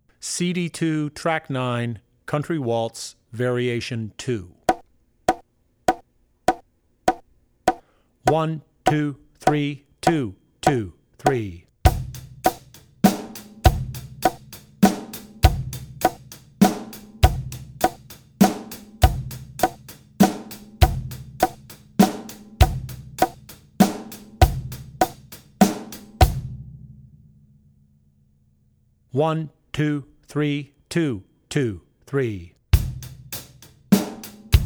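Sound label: Country - Country Waltz Var, Drum Tracks; Country Waltz (Variation 2); Drummer's Bible Tracks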